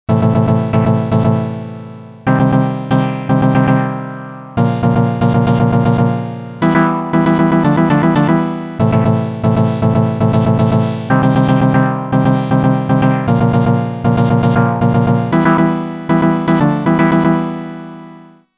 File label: Modal Craft Synth 2.0